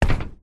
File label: footstep single flip flop on metal external platform floor; foley; zapsplat